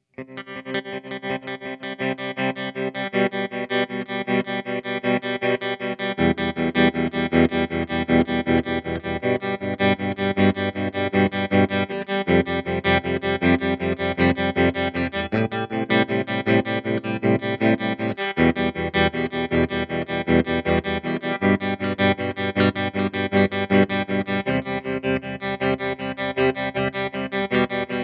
Rhythm 1 - Asymmetrical waveform. Full depth. An example of the rhythm feature.; empress tremolo